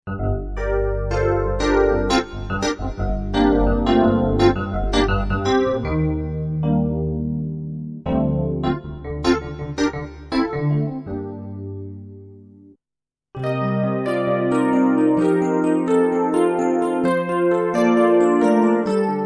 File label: DX E-Pianos